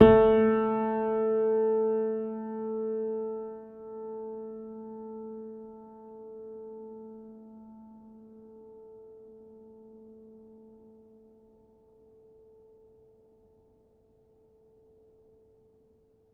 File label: Player, Upright Piano; healing soundscapes; HSS OP Pack